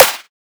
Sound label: Main Clap; Dubstep; Claps; Drums; One Shots